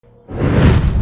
poison arrows

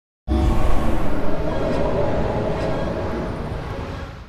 butterfly wave

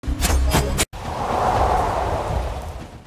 blades slash

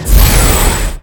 sonic cut

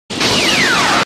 crystal wave

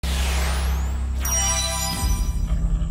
butterfly wave

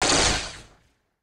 comet rain

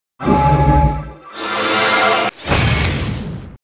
magic destruction

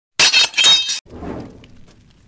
double slash

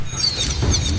swords crusher

